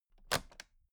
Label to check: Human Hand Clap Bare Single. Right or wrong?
Wrong